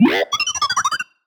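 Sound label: Sci-Fi Droid Robot Emote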